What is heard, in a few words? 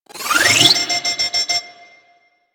Shield Lancer Pistol Alt Charge C; Warframe; Lasrian; Lasrian Soldiers; Weapons